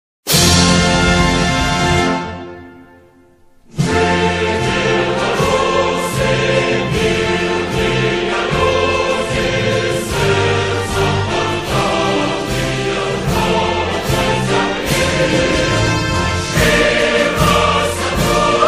National Anthem of Belarus with lyrics Belarusian Serbian English